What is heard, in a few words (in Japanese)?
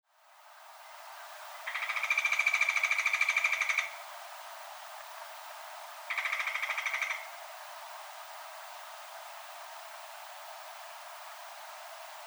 akashobin, yanbaru, koe; morishita